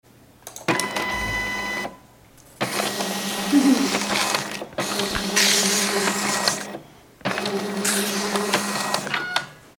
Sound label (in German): scanner